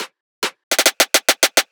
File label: Riddim Drum Fill 140